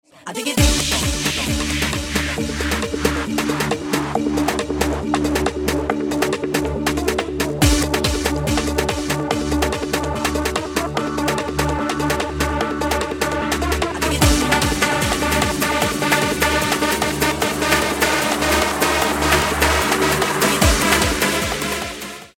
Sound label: BEAT